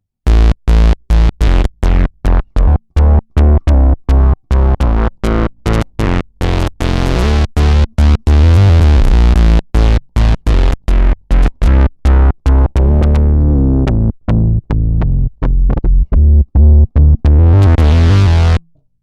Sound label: Electro Harmonix Bass Mono Synth Laser CTRL 0